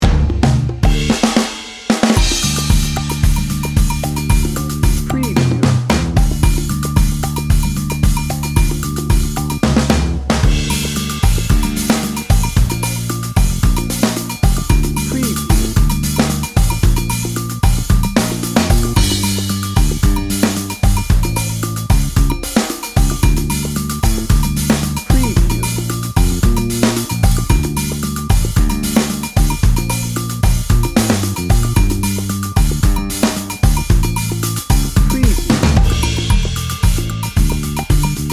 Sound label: salsa montuno dnb